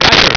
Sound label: geiger